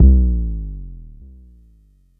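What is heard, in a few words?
Long bass drum, Jomox Airbase99, Drum Machines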